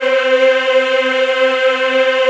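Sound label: C4 men women eh, Choir; USB Soundscan vol.28; AKAI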